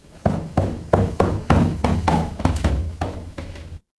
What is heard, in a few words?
footsteps stairs